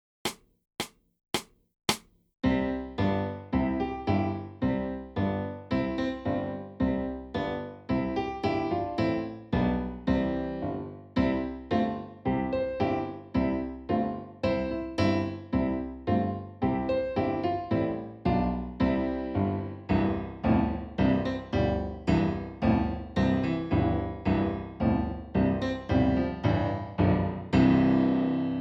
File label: TICK TOCK